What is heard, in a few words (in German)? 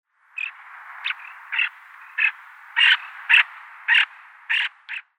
Graubrust-Strandlaeufer Calidris melanotos; Vogelstimmen